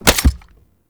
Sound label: Shotgun Mech; Warframe; Tenno; Alchemist